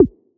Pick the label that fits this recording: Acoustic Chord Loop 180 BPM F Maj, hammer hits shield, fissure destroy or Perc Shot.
Perc Shot